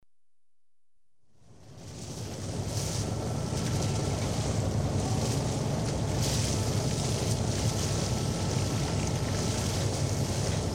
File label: Washing fish on deck with hose; The BBC Sound Effects Library